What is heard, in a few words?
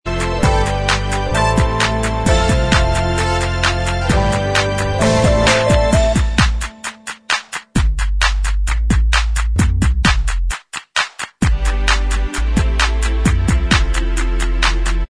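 instrumental, backing tracks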